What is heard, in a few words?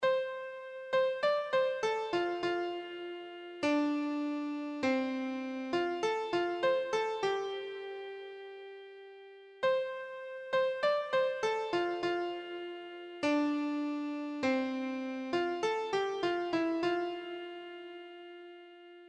what a friend, keyboard